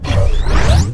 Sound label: shield; Tanks